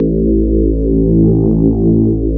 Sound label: electric pianos; Wizoo Electric Pianos